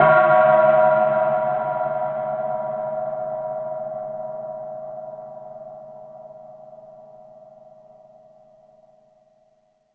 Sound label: Tool Kit, Drum Machines